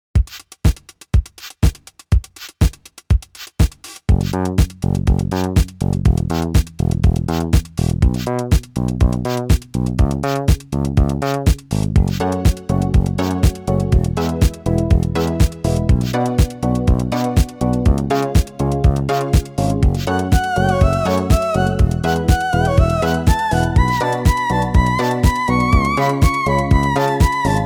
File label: Disco